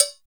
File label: LATNCOW3 CL, R+B KITS; R+B KITS2